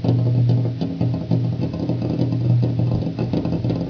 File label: rain; CHASM